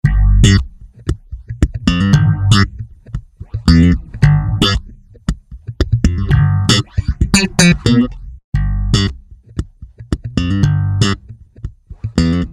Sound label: Instant Phaser Mk II Bass; Optical Delusion; Eventide